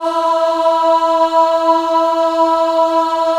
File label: E3 WOM AH, Choir; WOMEN; USB Soundscan vol.28; Acoustic & Synth; AKAI